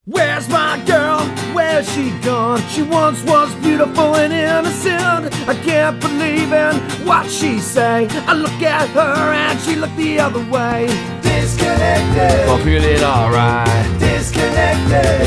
acoustic

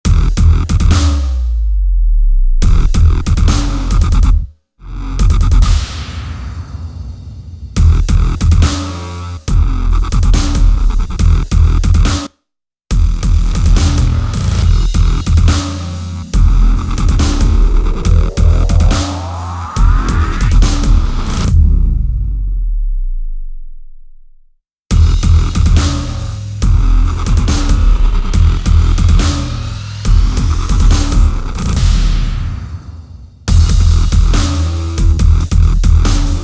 dog step drops electronic only mix

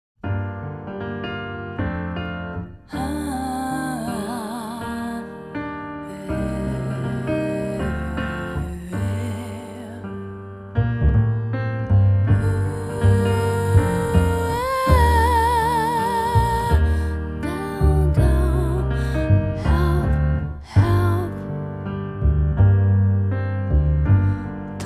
piano and voice style

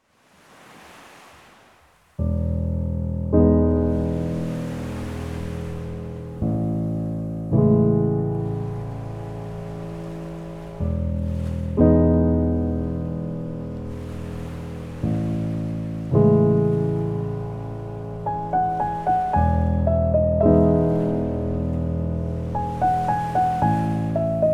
piano, ocean sounds

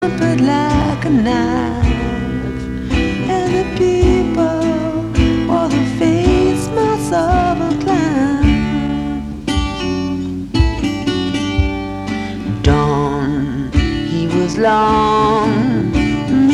acoustic and bass

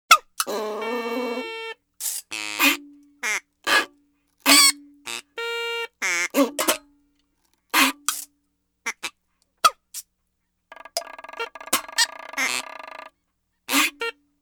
acoustic version

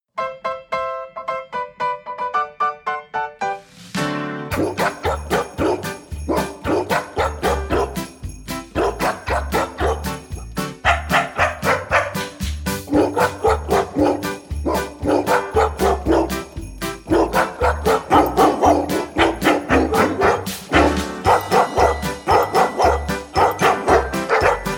jingle pets

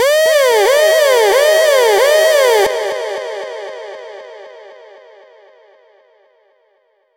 siren

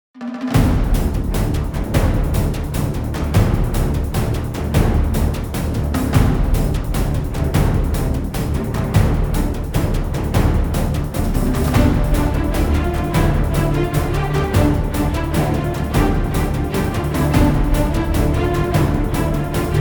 no choir

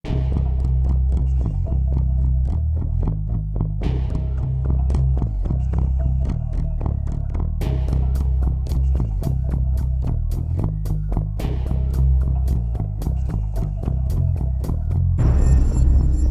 build

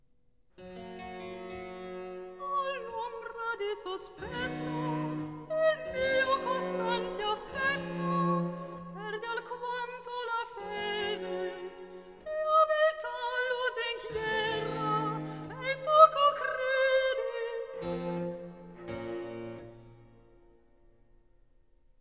cantata for soprano solo recitativo

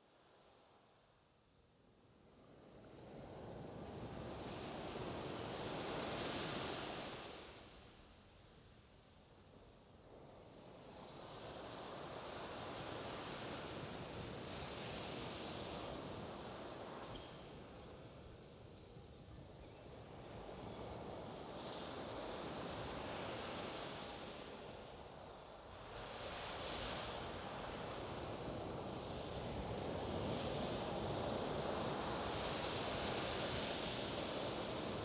sad sea waves

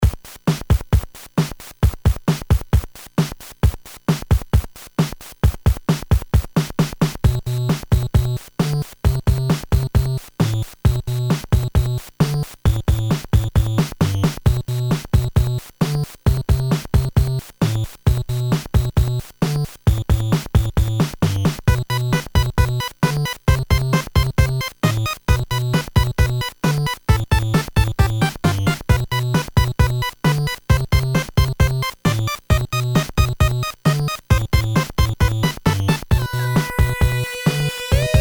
chiptune

